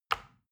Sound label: plastic button tiny push regular